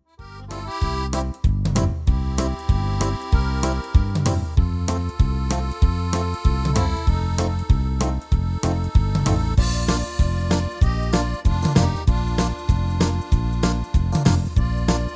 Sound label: MIDI